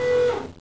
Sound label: turret rotate stop